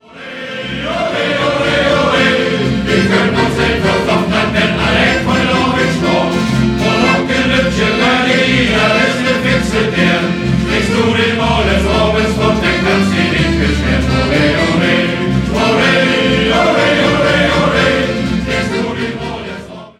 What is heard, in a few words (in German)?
Shanty Chor